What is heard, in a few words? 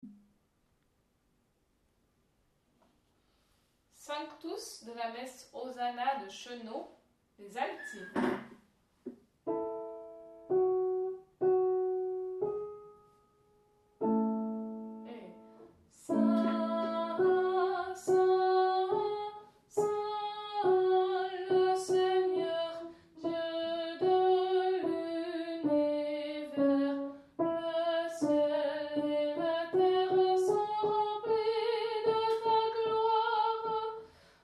sanctus messe hosanna alti